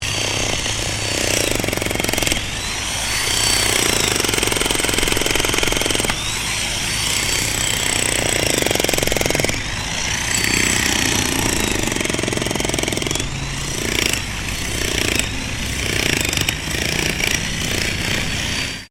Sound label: JACKHAMMER BOSCH ELECTRIC DIGGING SOIL STEREO, Construction Bosch jackhammer; Construction (Sound Effects